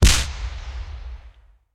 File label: Capsulte Explose Ballon Rocket